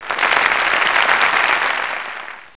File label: applause